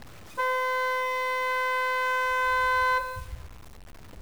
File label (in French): Son Kangoo arret Mono voiture